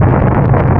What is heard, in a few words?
rocket lp; PC Gamer IT CD 30; incoming